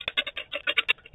rattle F

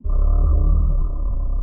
Rhino Grunt

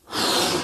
Inflating Balloon

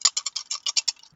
rattle G

